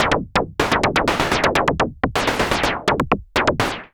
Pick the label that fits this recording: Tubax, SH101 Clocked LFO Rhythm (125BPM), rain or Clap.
SH101 Clocked LFO Rhythm (125BPM)